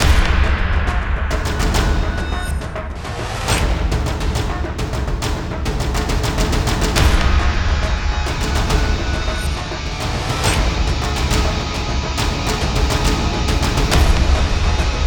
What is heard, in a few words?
Base Loop low